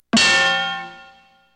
CUES; Into The Woods

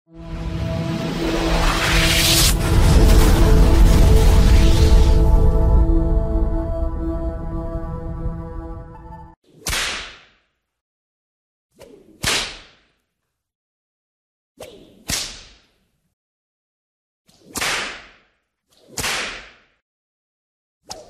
Whip Sound Effect

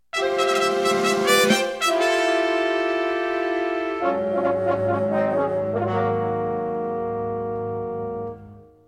FANFARES; Into The Woods; ACT 1

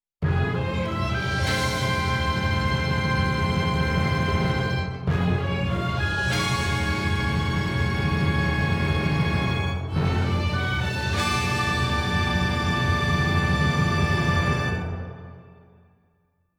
MAGIC SMOKE CHORDS

